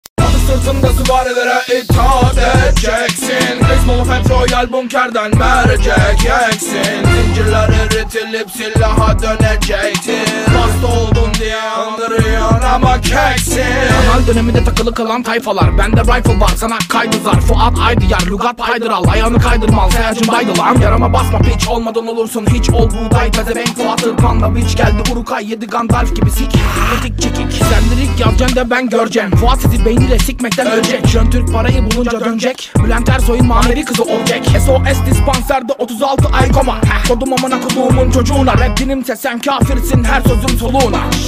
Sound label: acapella; istanbul sozlesmesi